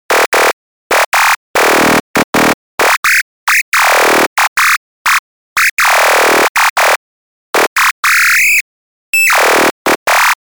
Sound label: fm lead; serum